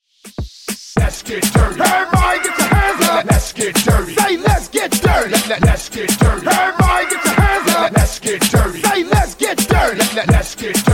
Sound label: Hype Tiktok Mashup 103Bpm